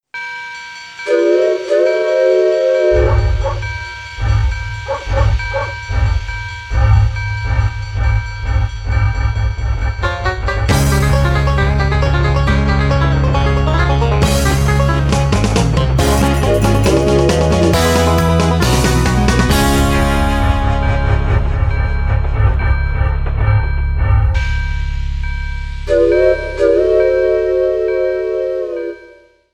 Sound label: Lowrey Train